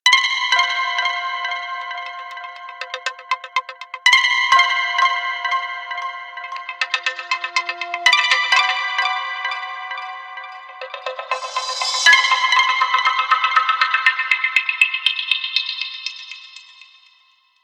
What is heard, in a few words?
Waiting Room, ringtones; Motorola MOTOLUXE-XT615